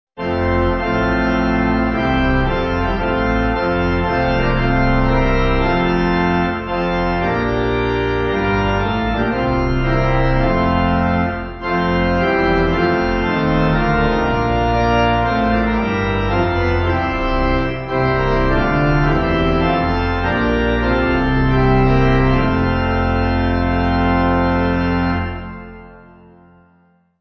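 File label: Pipe, Pipe Organ backing; Behold The Mountain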